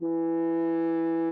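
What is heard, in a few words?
French horn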